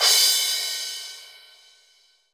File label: Crash, Cymbals